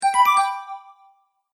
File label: Magical Message Notification; Musical